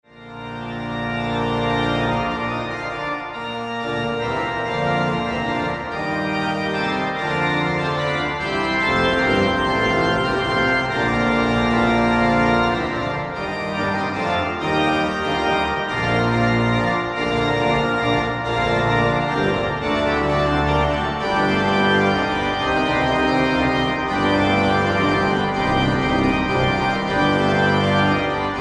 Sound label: Magnificat secundi toni quartus versus, orgue; Matthias Weckmann